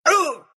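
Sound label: pain, Russian; dota2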